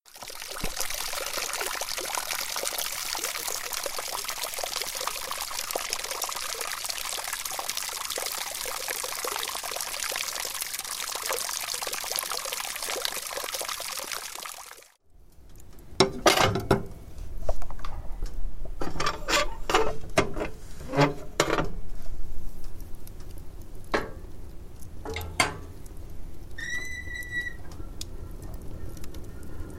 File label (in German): Alte Geräusche Mix